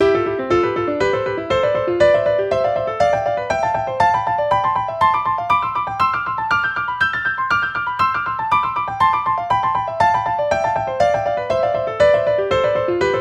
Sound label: Exercise - Double-thirds plus Thumb